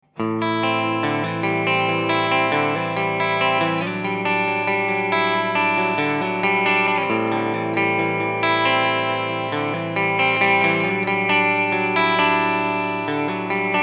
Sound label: Les Paul clean